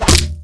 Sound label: BEATTACK